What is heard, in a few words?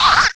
GIT, Drum & Bass, 145 BPM; Ueberschall; Drum & Bass Flash Trackz